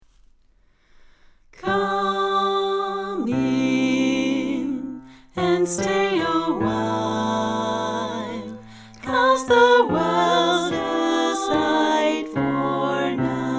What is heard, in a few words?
Bass come in; Singalongs